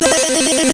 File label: bwelelelele; Truth-Hz - 1-Hit KO Sample Pack